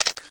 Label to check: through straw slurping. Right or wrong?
Wrong